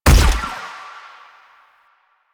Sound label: Shot Fire, Weapons; Warframe; Acceltra Prime